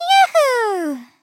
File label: Yahoo; Teto; SM64 CO-OP; Triple Baka Pack